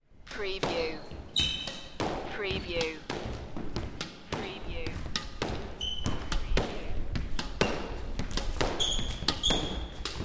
Sports Squash Hitting Ball Repeatedly Against Wall